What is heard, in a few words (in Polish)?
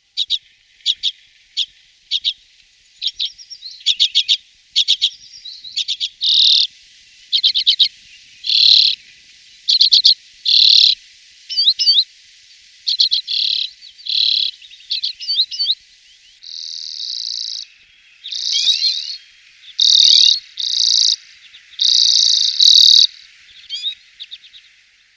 rzepołuch - dłuższy występ